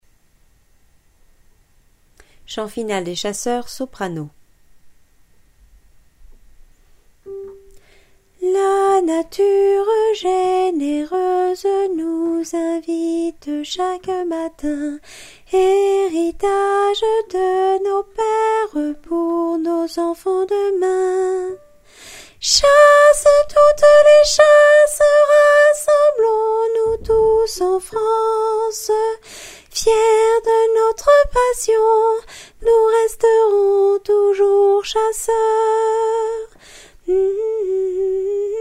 hymne des chasseurs soprano

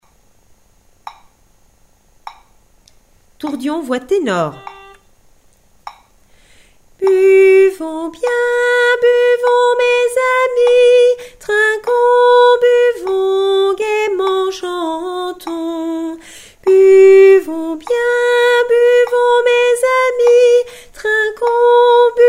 tenor

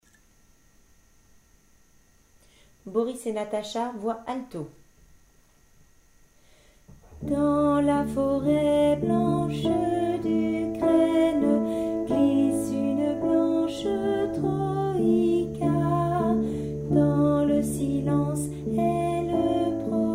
alto

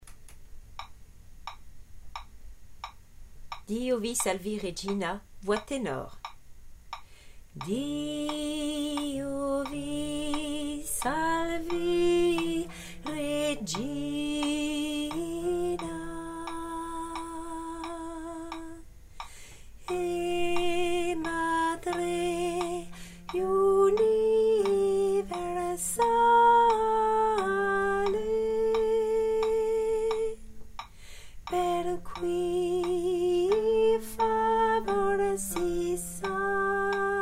tenor

